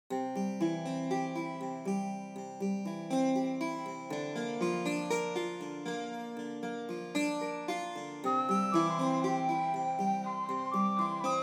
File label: Greek Music